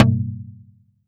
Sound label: Tom